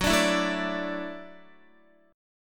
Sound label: Gb Minor 7th Sharp 5th, Guitar; strummed; JGuitar